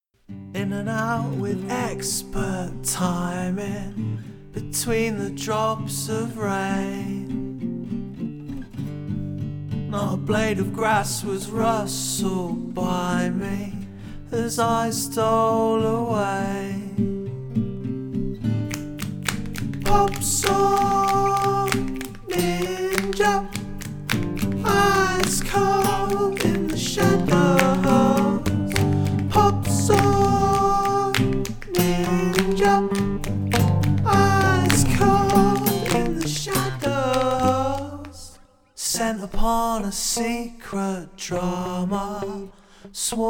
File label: pop song